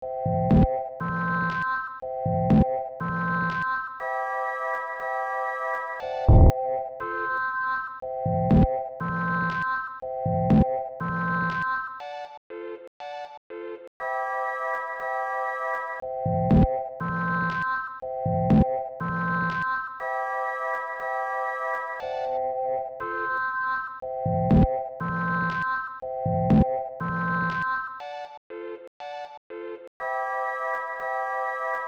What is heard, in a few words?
Sinewave